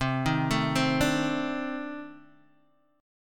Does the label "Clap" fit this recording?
No